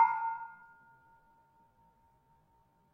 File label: Barung 5 A Sharp, gamelan tuned; gravityharps